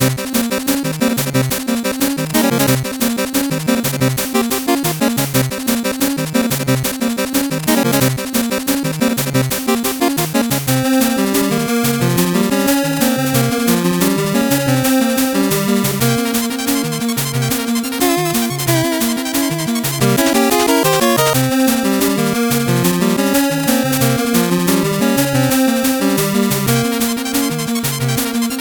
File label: 8bit bgm fast